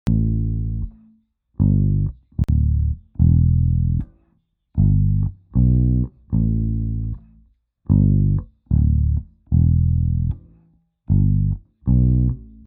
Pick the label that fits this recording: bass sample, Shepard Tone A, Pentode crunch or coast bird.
bass sample